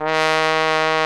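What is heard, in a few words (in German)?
POSAUNE VIBRATO; Best Service; Alpine Volksmusik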